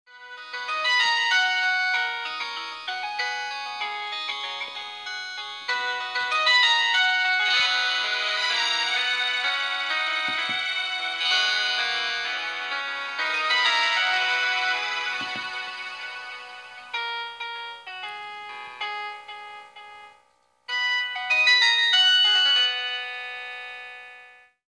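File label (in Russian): zvuk vhodyashego vizova, ringtone; samsung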